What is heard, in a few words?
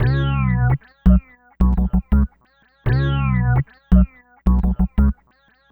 Funk Riff